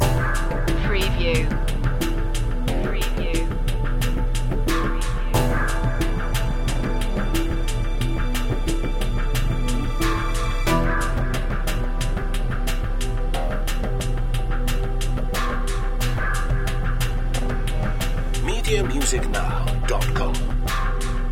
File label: icescape loop